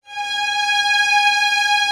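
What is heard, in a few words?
Vio legato G#4; Digital Sound Factory Virtuoso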